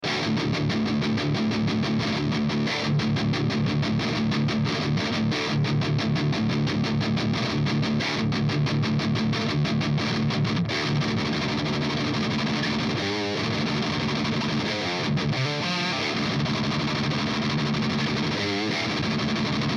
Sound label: Seymour Duncan Nazgul Rectifier